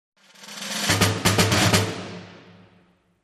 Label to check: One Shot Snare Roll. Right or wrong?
Right